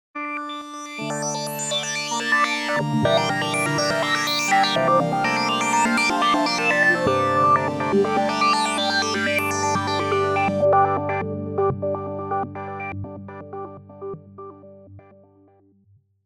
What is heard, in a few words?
sweep sample hold; opxpro